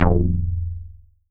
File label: BASS SH09 F0, Synths, Roland SH-09, Bass